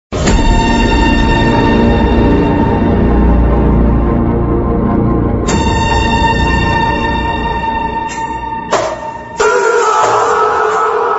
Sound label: Instrumental Bgm; Bheemla Nayak